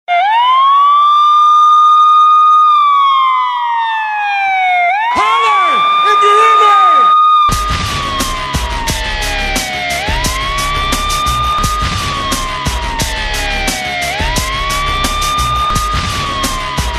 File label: Siren